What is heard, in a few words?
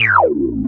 Bass Lick, Drum & Bass; Fluid Dynamics